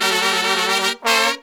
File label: Horns 132BPM; Zero-G; Phantom Horns